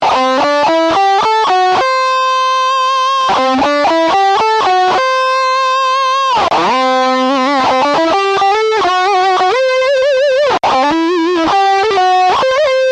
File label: Expressive Guitar Lick Variations